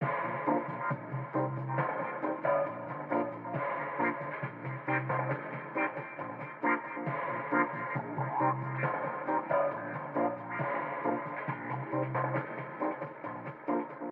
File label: radio music ambient; Mirror's Edge (2008)